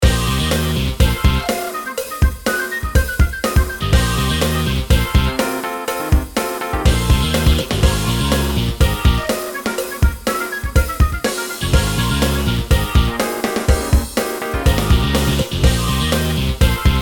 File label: Guitar Mayhem